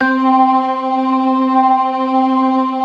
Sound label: ORGAN, 4 DRAWBARS; Keith Emerson Signature Series